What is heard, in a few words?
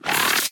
say, spider